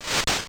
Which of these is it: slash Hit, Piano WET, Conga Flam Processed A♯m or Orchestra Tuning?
slash Hit